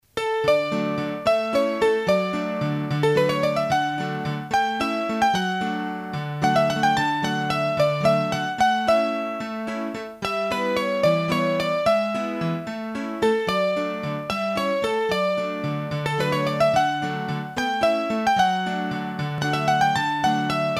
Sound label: Un Soir, trad, hurdy-gurdy, Valses; Massif Central